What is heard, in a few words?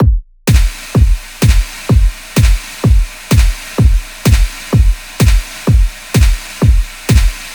Drum Loop Kick Snare 127